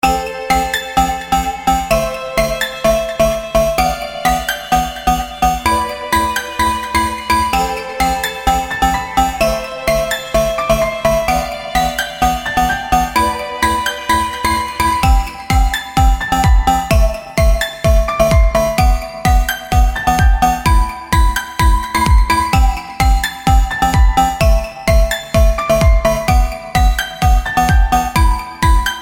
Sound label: piano club